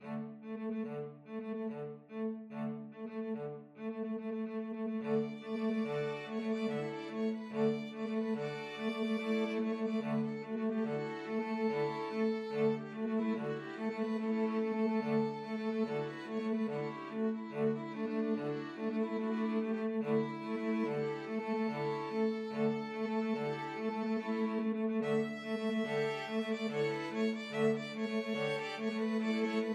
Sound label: bolero STR quart, string quartet